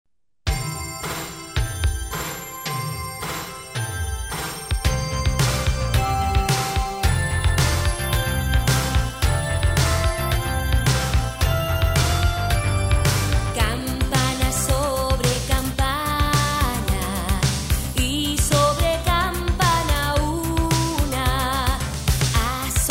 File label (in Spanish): campana sobre campana